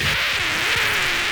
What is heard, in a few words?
needle scratch, Vinyl; Misc Percussion